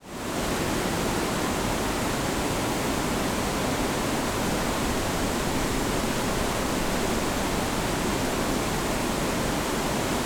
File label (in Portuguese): Barragem de usina de cana